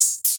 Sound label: Open Hat (Swing); One Shots; Cymatics; DOPE DRUMS VOL. 1